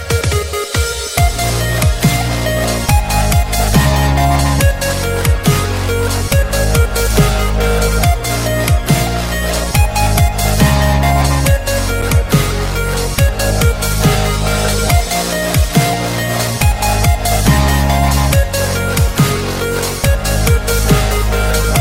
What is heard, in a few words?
DubStep Romantic